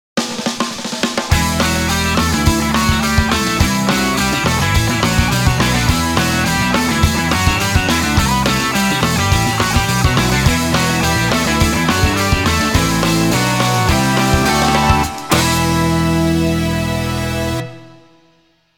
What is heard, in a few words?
JINGLE INVINCIBLE; sth2006